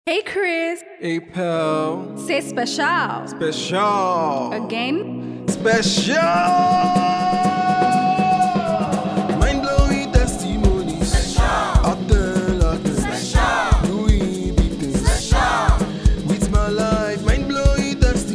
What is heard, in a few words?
English Jingle